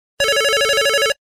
Single Tone

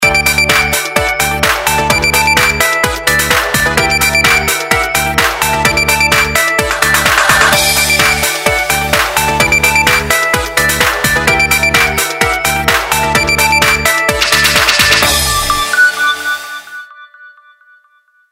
jingle

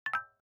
sent message success; Coolpad Fancy-3

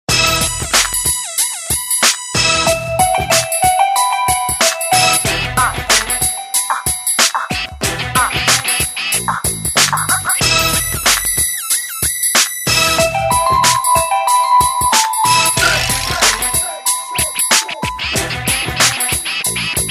Ringtone, Samsung GT-C3510

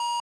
Beep

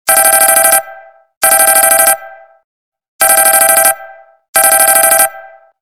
Minimal tone; Samsung; Galaxy Ace India; Ringtones

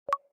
Video Stop, ui; ACE Clever-1

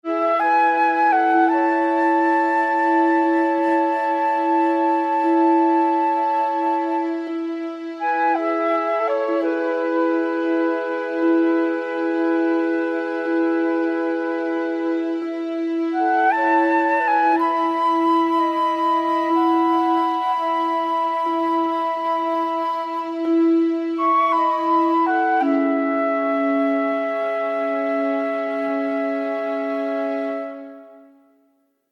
Flute Intro; LG

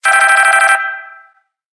Minimal tone; Samsung Galaxy Tab GT-P1000 India

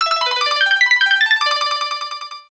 Tremor

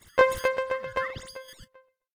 Bounce, notifications

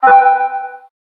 Touch Pop

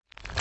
mahjong undo usage; Nokia 770 Internet Tablet; game

